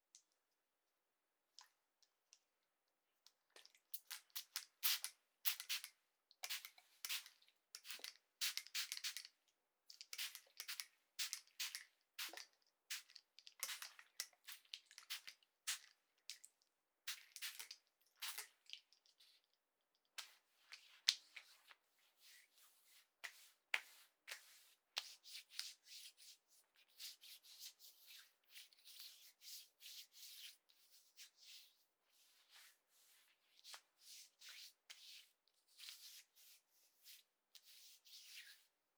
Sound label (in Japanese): 虫よけスプレーを使う, ASMR; 動作系